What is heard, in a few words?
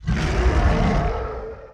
Hybrid Growl, Vocals - Monster; Sony Pictures Sound Effects Series